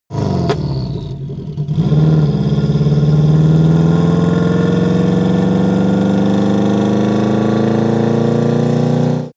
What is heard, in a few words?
car vw t1 drive gear shift accelerate